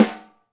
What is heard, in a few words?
rim, Alesis DM5